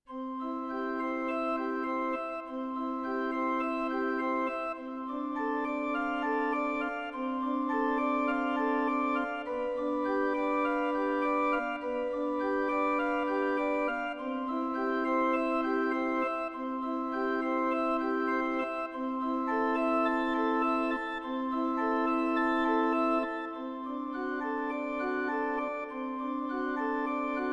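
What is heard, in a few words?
Pipe Organ Principal, digital piano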